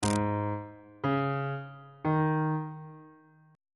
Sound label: a flat suspended 4th triad chord intervals on bass clef